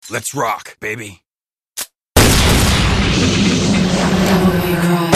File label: vocal